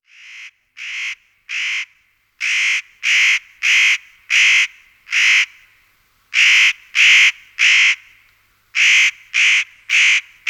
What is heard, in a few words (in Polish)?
Orzechowka, Ptaki; Kurs PTTK; Przyroda